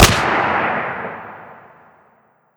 medium far distant, weapons; Modern Warfare

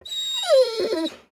death; Rust

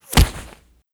cloth bullet impact; Rust

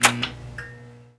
switch auto lights off

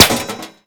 Projectile Sounds; Red Orchestra Ostfront 41-45

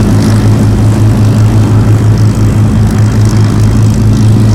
avro lancaster rear, engines; War Thunder

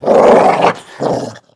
dog growl; Call of Duty Modern Warfare

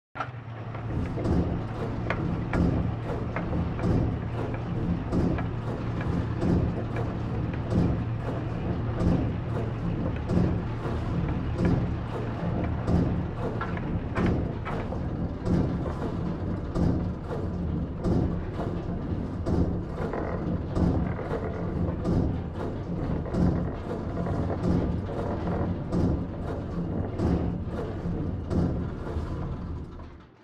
ship engines; World Of Warships

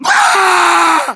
melee charge, voiceovers; english

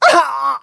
death

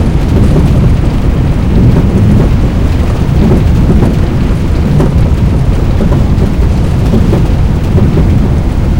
Ground Slide In, Aircrafts; DCS

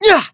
pain

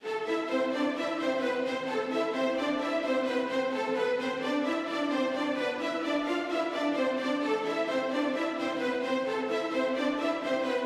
music interactive; World of Tanks

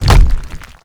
asphalt block fall

